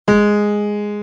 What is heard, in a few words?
2 G#, piano